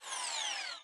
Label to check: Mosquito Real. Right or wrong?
Wrong